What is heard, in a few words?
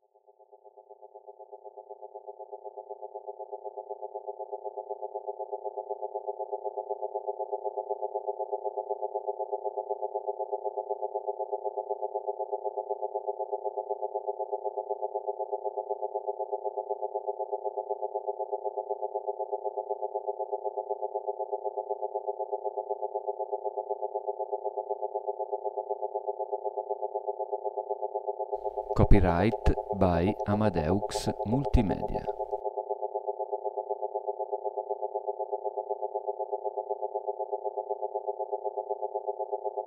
solfeggio 8hz